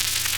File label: Buzzing Power Glitch